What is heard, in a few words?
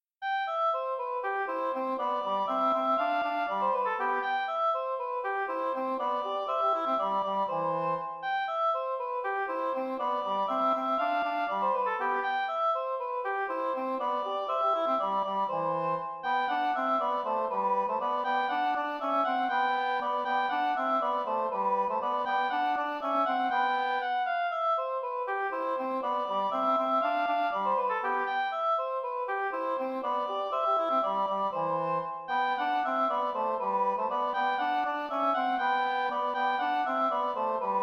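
duo oboe; heberle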